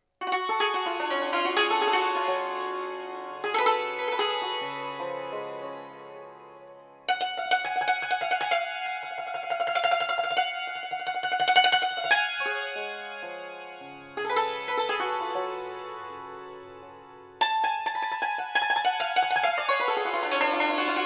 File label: Delnavaz (Santur Solo)